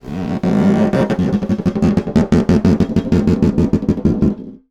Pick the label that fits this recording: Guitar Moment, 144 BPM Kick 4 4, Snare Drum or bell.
Snare Drum